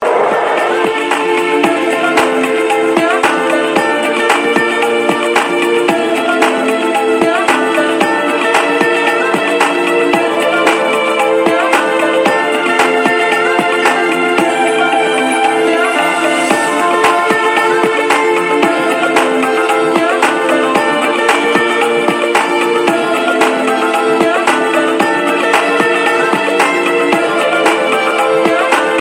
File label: music tone; hbl psl